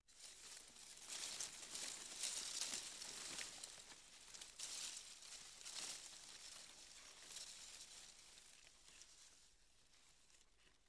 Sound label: leaf rustle loop